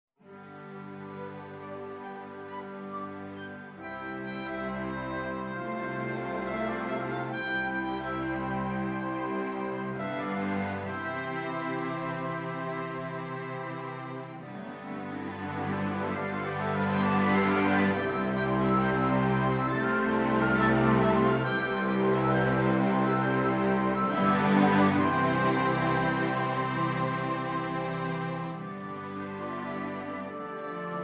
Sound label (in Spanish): SINTONIA para flauta oboe 2 clar 2 Vc y lira; para flauta, oboe, 2 clarinetes, 2 violonchelos y lira; Fernando Bonete Piqueras